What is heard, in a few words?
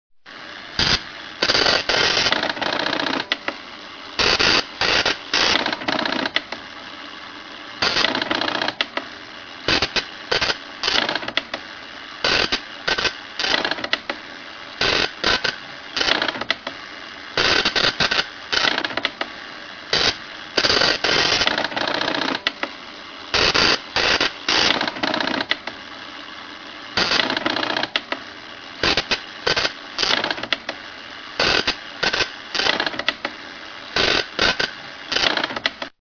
Primitive computer